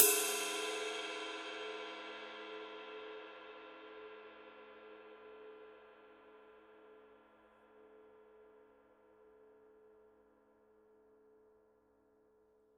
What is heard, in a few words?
21 medium ride body, paiste; masters